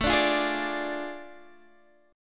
A Major 7th Suspended 4th Sharp 5th, Guitar, Open D